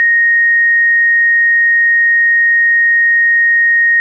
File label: Clean Sine DX100 As5